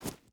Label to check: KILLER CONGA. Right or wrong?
Wrong